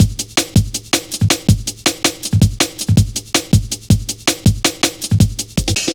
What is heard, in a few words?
Drum Loops, Jungle